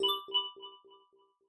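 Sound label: original ringtones and notifications samsung galaxy s22 s22+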